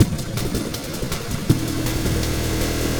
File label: ENERGY PULSE, HYPERSPACE PULSE GROOVES